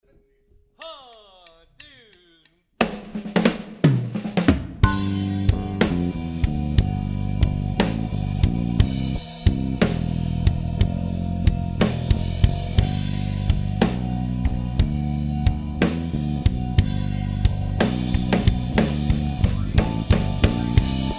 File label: Rain No Guitar G